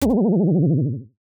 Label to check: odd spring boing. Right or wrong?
Right